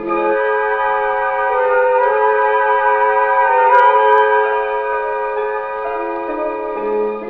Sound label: strings, Sad